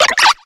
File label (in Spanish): Grito; Oshawott